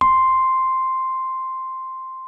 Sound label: C6, RHODES; Best Service ProSamples vol.42